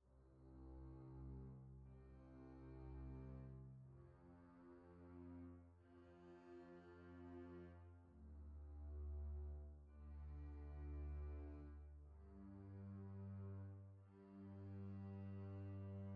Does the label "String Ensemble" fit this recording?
Yes